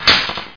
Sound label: Crowbar; The Crow City of Angels